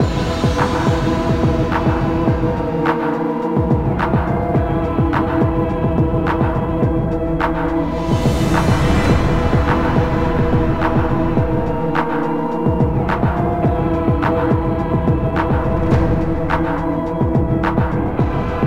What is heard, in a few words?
Police Station Radio Inside Close; 9mm; Android